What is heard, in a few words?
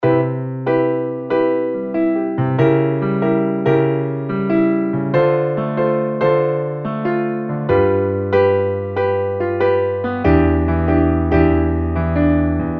piano